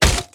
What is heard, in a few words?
step walk